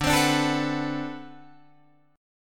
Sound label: D 13th D, down, Guitar, Drop D